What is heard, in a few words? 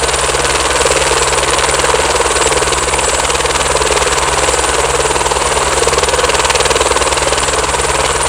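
blade vortex, Airbus Helicopters AS350; FGUK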